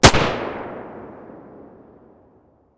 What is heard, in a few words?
beretta muzzle mono distant, weapons